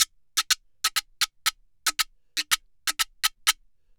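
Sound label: GIRO SALSA, salsa drums and percussion; Best Service ProSamples vol.14; AKAI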